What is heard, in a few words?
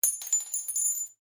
Cartridge 7.62mm impact ceramic tiles, shell casings; shell casings of different calibers impacting on different surfaces